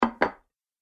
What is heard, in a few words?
Large Dinner Plate Placed On Table; Dishware Sound Effects; Household Sound Effect; Andrew Fleming